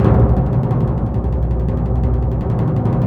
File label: TMP C#, Synclavier Percussion